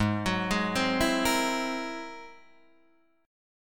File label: G sharp Augmented 9th G sharp Guitar Open D